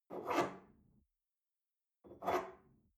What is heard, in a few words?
espresso grab from table medium, restaurant cafe